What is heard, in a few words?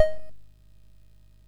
sid MOS 6581R2